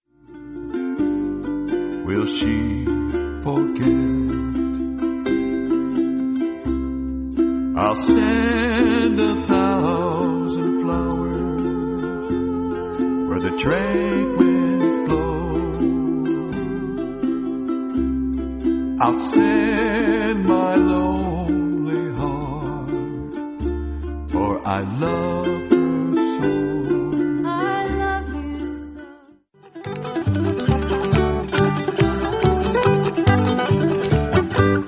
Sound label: ukulele